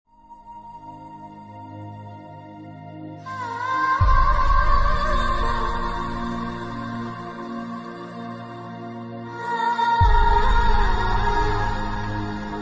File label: Sad Theme Music Ringtone; ringtones; Ek Villain